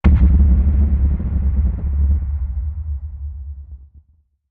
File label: Hit Doom Boom